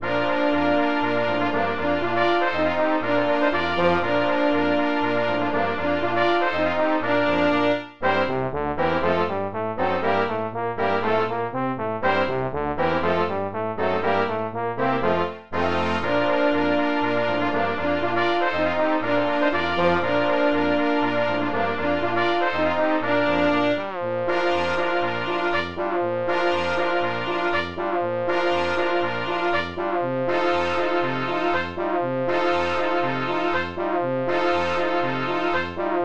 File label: Brass Music